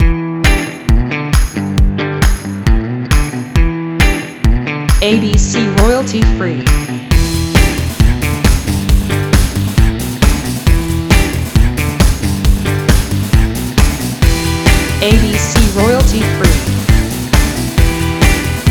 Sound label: Gym Rock